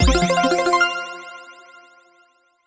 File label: high symbol win; Hot Spin Deluxe